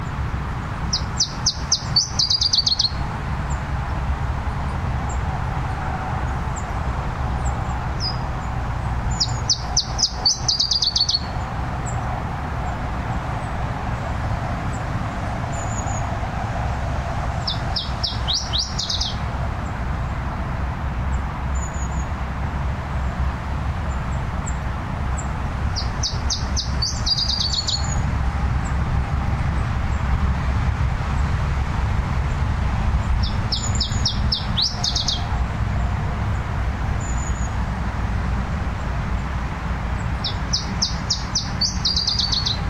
iberischer zilpzalp

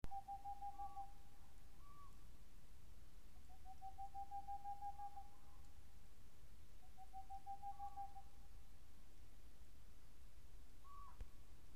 raufusskauz

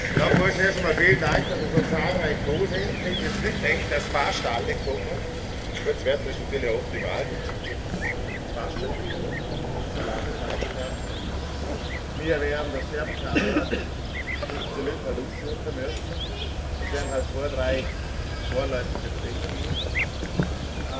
bienenfresser

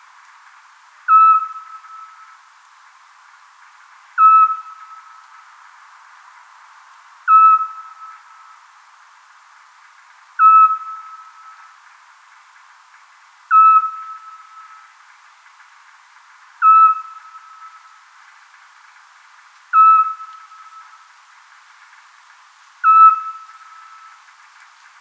zwergohreule

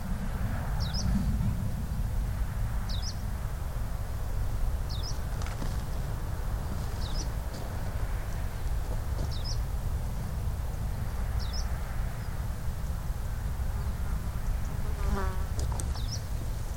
tienshanlaubsaenger

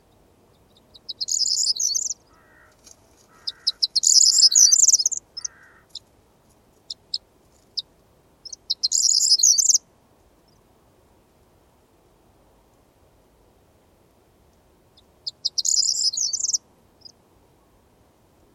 grauammer

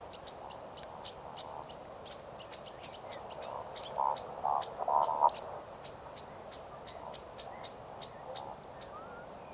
waldschnepfe

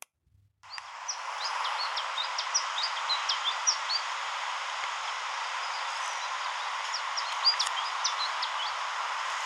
taigazilpzalp

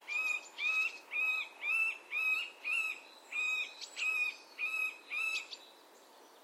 mittelspecht

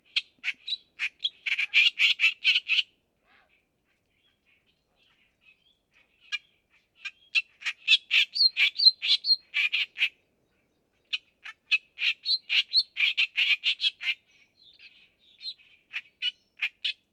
stentorrohrsaenger

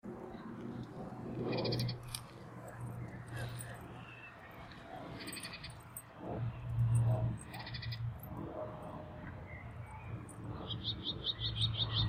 orpheusspoetter, club300

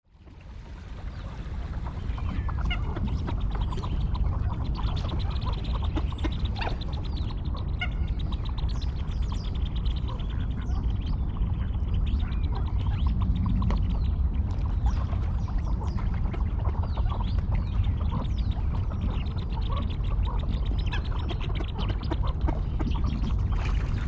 mandarinente

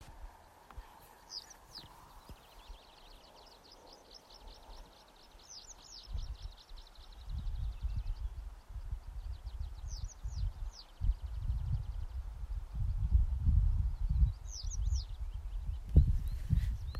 zitronenstelze

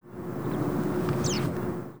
steppenpieper

